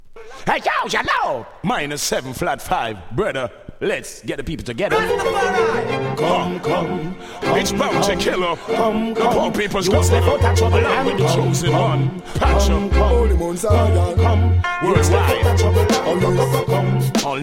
riddim

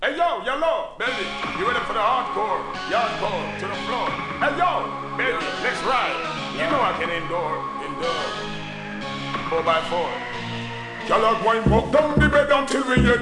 riddim

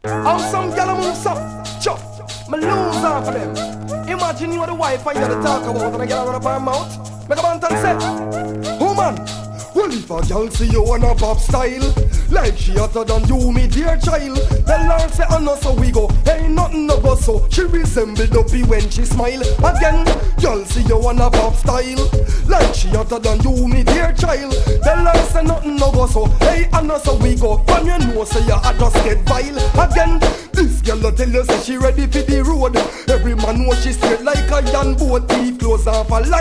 pop style riddim

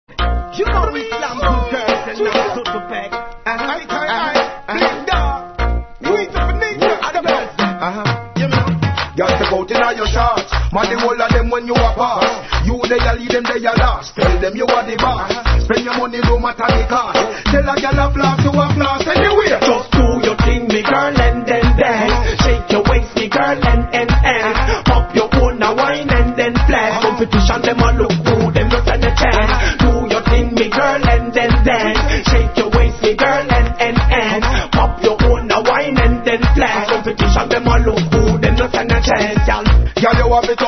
riddim

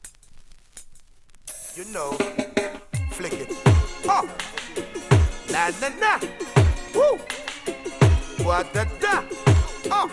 riddim

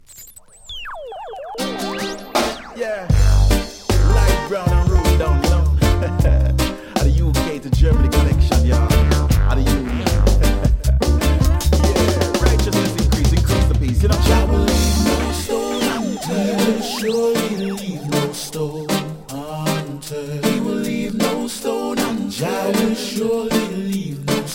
riddim

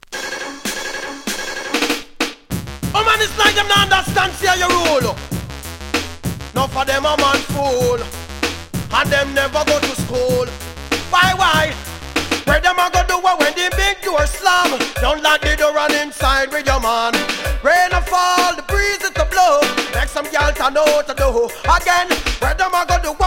riddim

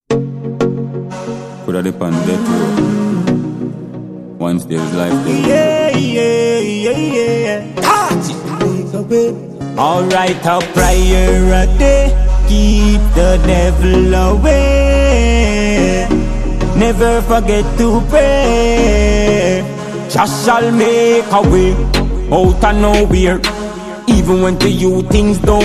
Riddim

